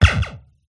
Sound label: Laser-Like Synth Laser Sweep Burst; sound spark